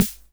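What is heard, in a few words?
snare drum; Best Service Dance Mega Drums; MAESTRO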